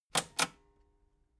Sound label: metal button cassette stop fast